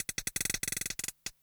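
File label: loop intro, 167 BPM; Ueberschall; Drum & Bass Resonance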